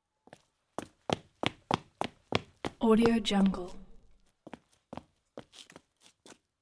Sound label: running footsteps